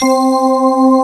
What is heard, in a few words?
D50 STACATTO; KEYS; Alpine Volksmusik